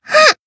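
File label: damaged, Japanese; leviachan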